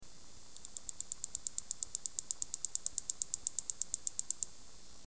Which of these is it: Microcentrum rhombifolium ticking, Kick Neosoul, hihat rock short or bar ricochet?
Microcentrum rhombifolium ticking